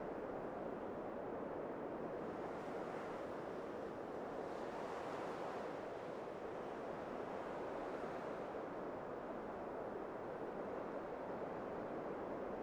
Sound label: wind loop; dirtmouth; Hollow Knight